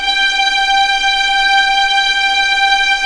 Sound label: G5 EXP MF, VIOLINS; East West; The Ultimate String Collection